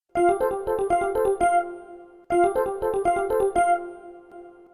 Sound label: Ringtone; win7